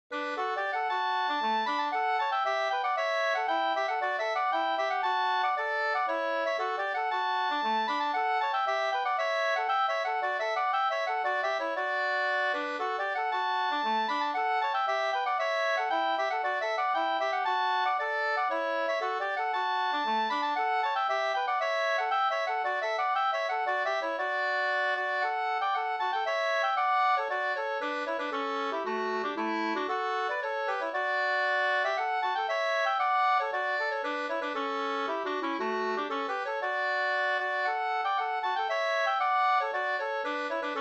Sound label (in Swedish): polska; Orust